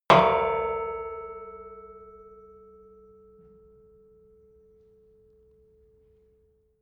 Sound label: metal impact girder long